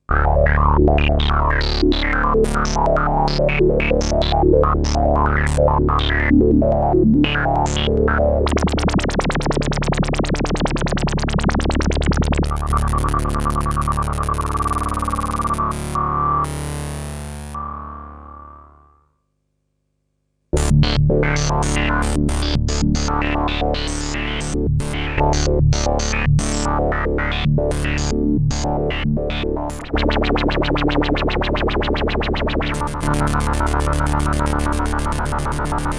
OB VCF Mod LFO

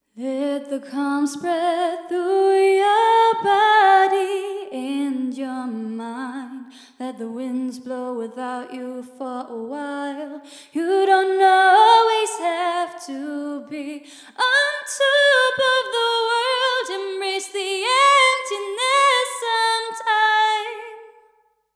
Vocals female EMT250

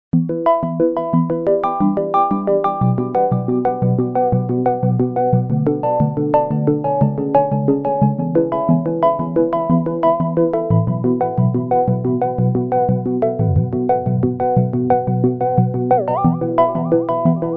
jup6 arpeggio

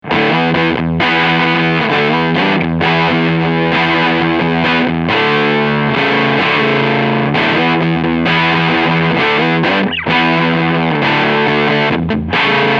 Medium Gain Low Fat

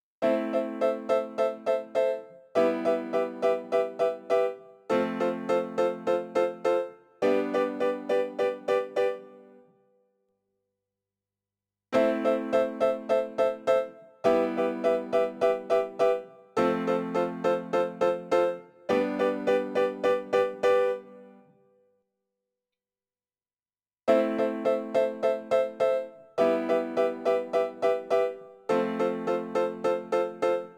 Piano; BLA 172B

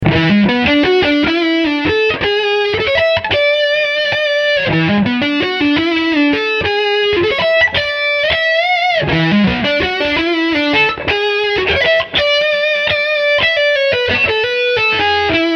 Lick Neck Pickup

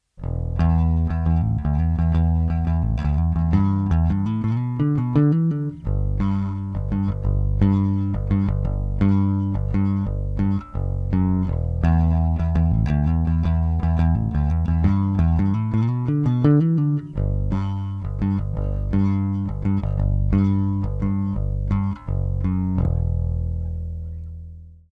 Bass, Preamp; Avalon U5; Preamp300

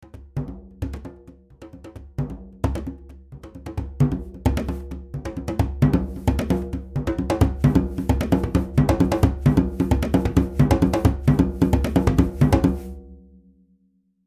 Multi Tam Drum Velocity

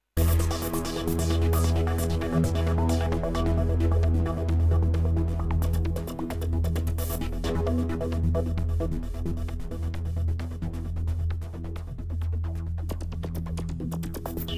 Percolating Rhythm; Combi

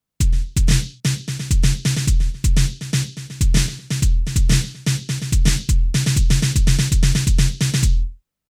Roland TM 2 Clappin Beat

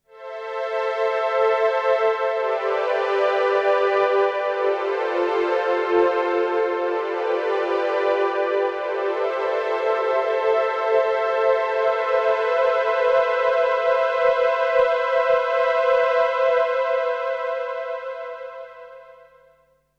Prophet VS

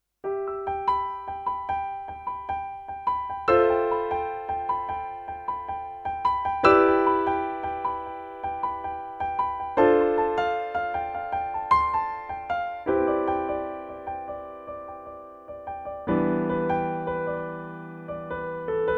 F 20 Piano

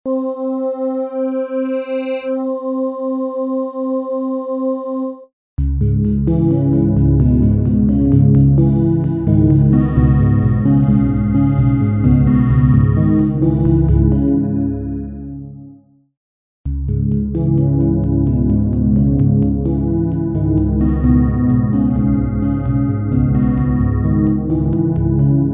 MWXT 48kHz Org Sample modulation; EIII; STP modulation

